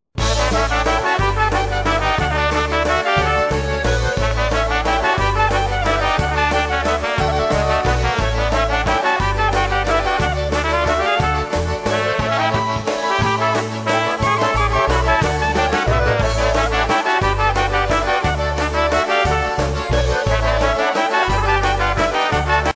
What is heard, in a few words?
instrumental